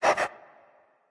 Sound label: dog pant; smite; scylla